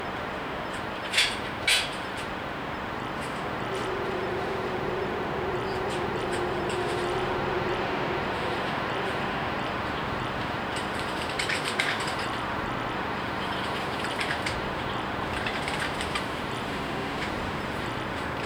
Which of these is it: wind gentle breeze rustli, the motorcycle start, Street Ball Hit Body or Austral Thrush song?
wind gentle breeze rustli